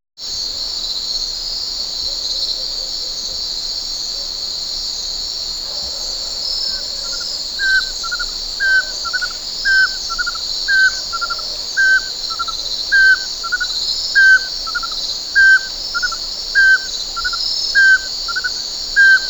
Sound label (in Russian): sich vorobinii ne spit, zvuki prirodi